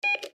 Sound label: Telephone Press Button Beep Speakerphone; Office; Telephones; Blastwave